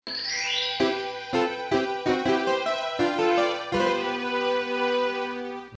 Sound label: Happy Message; Mario64